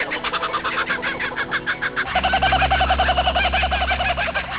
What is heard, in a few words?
Kooky Call